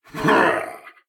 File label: Combat; Eshimal; SyrinScape; Snarl and Oraculum